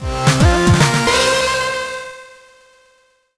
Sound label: Jingle Powerup; Spider-Man: Mysterio's Menace; GBA